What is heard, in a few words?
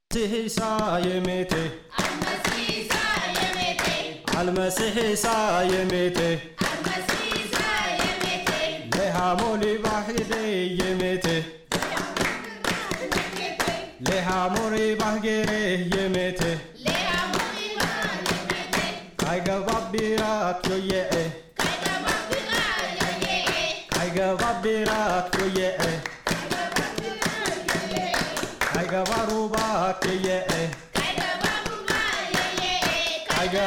Afar Song; Isa Almazih Lee Amol Gere; Jesus Walks on Water